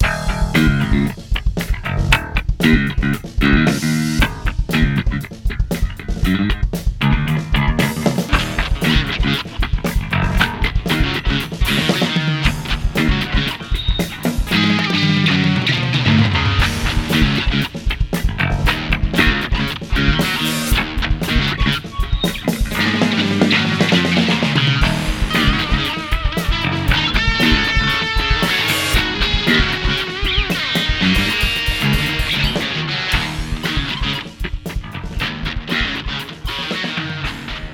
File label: Funk Tune